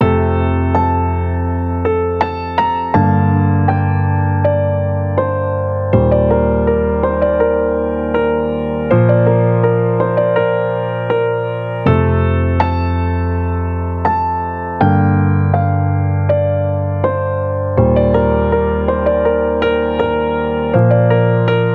Emotional Melody Bb Maj G Min 81 BPM, piano; nikos piano sample pack

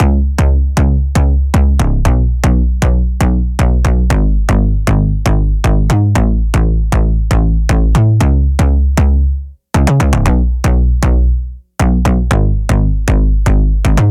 Bassline Grooving 118BPM; nikos ultimate midi pack

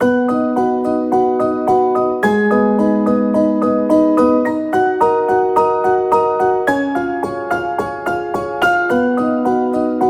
Back Forth Arp Mystery 100BPM, piano; nikos ultimate midi pack

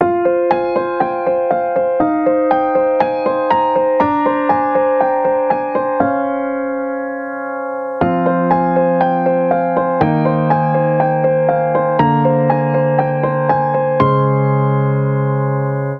Unique Melody Solemn Ab Maj F Min 120 BPM, piano; nikos piano sample pack

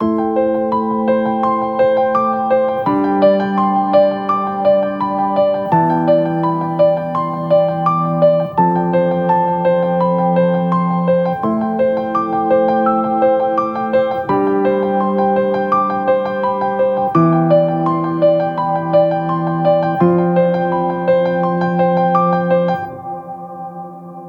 Back Forth Arp Light 84BPM, piano; nikos ultimate midi pack

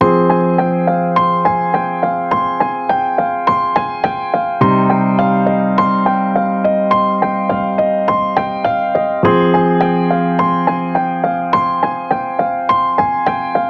Dark Melody Waiting Ab Maj F Min 104 BPM, piano; nikos piano sample pack

